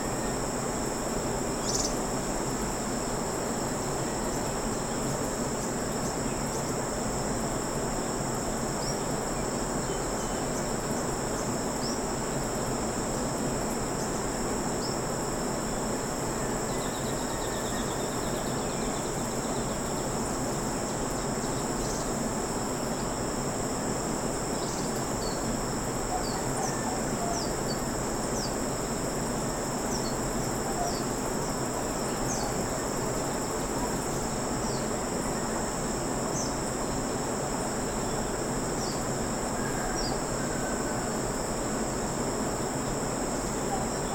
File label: Morning River, Banaue Rice Terraces; Ambience; InspectorJ